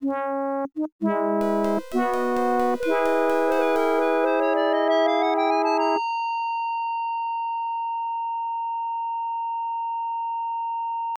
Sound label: Balloon Released